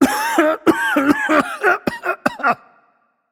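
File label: nonverbal clear throat; voicepack; smite; merlin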